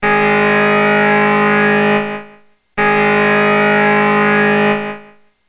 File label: Hospital Code